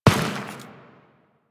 footstep dirt; Step Dirt, Footsteps; Warframe; Teshin

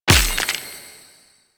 Mining Laser Gem Fill; Weapons; Warframe; Eidolon Mining Lasers

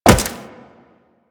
Wall Jump Metal

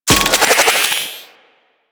Shield Vampire Blast; DE; Warframe; Weapons; Sentinel; Abilities

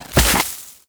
Ice Proj Fire A; Warframe; Corpus; Arachnoid Camper Terra; Abilities

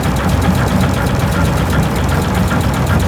Spin Machine Loop; ambience; Warframe; Grineer Galleon

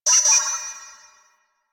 UI Wrong; Warframe; Quests; The New War; Zariman Class Quiz

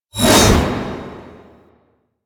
Hammer Glass Whoosh D; Warframe; Weapons

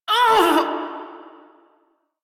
pain, female; Railjack Crew; Steel Meridian

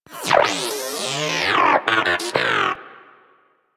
Moa Inquisitive Vocal Death, Pets; Warframe

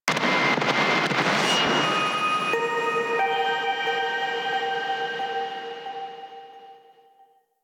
Mnemonic Static A; DE; Warframe; Night Wave

